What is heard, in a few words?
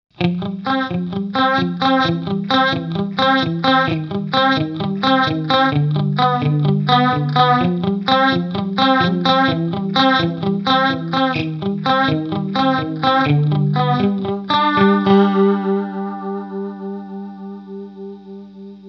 Comp modulation; MOD Devices MOD Duo